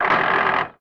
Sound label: GEAR GRIND; SIMUZENSOUND